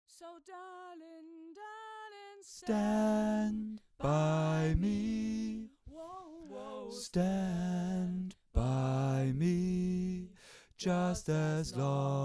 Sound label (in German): 3te Stimme